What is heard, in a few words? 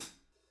Hh Custom Foot Hold